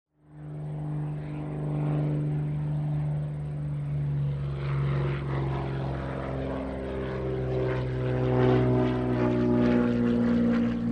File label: Transportation Plane Flyby